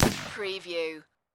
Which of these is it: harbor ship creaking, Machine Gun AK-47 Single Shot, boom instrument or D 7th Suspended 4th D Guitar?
Machine Gun AK-47 Single Shot